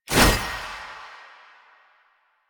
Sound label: Gunbrella Aim Transition Out, Weapons; Warframe; Tenno; Koumei